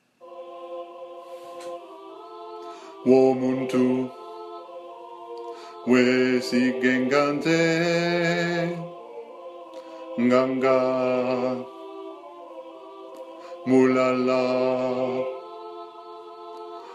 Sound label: bass; ai omwana womuntu